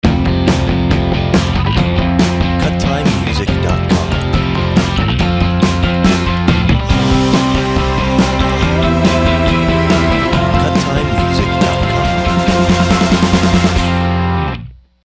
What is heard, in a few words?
Rock